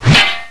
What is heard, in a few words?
wrench big hit